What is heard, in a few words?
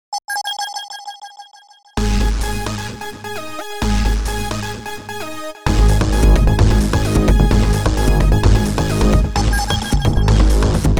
Electronic, EDM